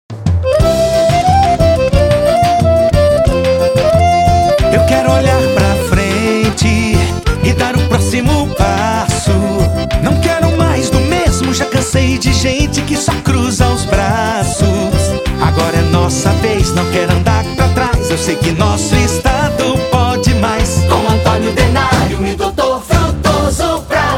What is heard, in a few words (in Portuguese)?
forro xotezin